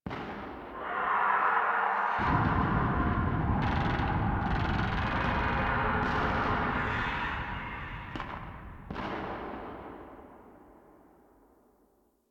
Ambient Distant Explosions; Infested; Warframe; NineteenNinetyNine

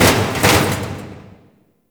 Destruction Small Metal Hits; Warframe; Corpus Railjack

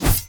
Melee Block Start, Whooshes; Warframe; Weapons

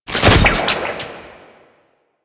Grapple Rope Break

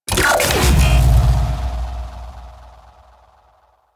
Artifact Insert; Missions; Sentient Defend

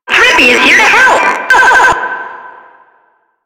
Tentacle Swing; Warframe; Proto Infested Ancient; Abilities

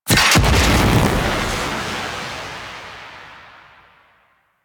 Radiation Barrel Explosion; Warframe; Ambience; Corpus Gas City; Gameplay Remaster

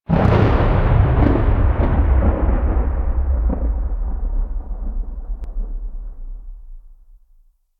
Invasion Thunder; Warframe; Cetus

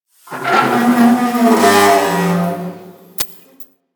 Ocean Pipe Moan; Warframe; Grineer Ocean

